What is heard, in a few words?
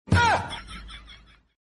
aaa funny sound effect